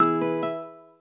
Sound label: Ringtone, Nokia